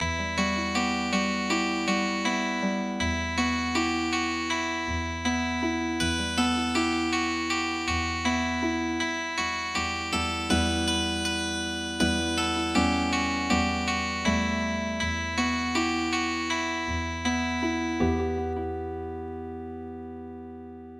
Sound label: canon, Healing Harp; traditional